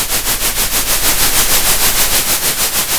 center, Sound of a train

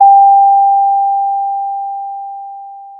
Sound of a doorbell

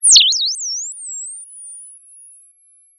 Sound of a bird tweeting nicely

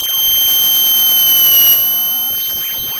pop, Sound of a cat meowing

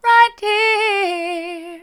right here soft, vocal

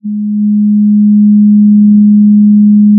pop, Sound of a cruise ship horn

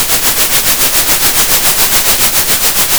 pop, Sound of a train

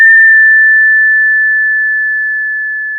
pop, Sound of a school bell

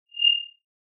Sound of an owl

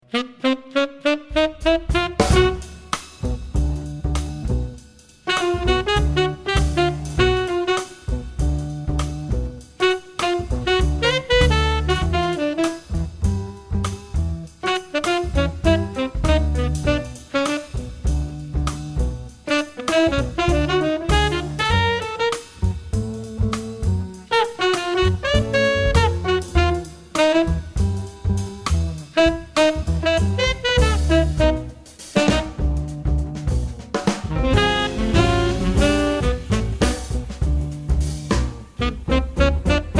melody

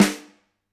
Snr, drum

